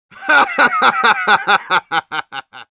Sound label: laugh happy, ru; медика